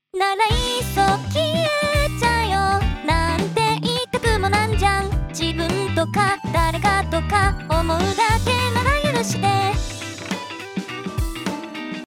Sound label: Synthesizer V